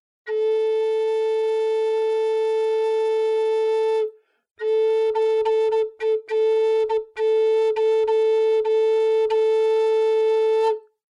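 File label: A American Flute low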